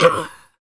FEM PERC, Vocal Jazz FX; Spectrasonics Vocal Planet

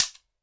punch, handy percussion

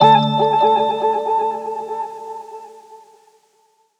synth note little fifth D; Virtual Riot; Synth Notes & Chords

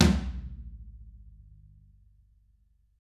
TM HIT, Drums

